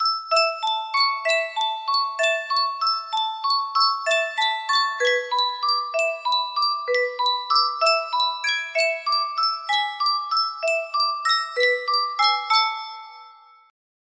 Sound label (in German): yunsheng spieluhr